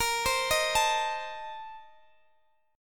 A sharp Major 7th Suspended 2nd Suspended 4th A sharp Guitar DADGAD; arpeggiated